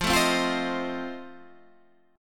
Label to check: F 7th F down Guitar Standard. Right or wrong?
Right